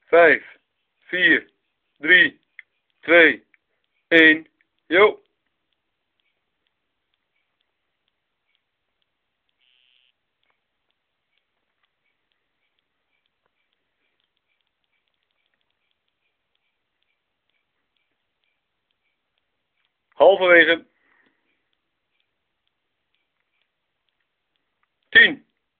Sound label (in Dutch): interval halve minuut